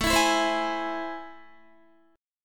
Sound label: A Minor Major 7th, down, Guitar; strummed; AmM7; JGuitar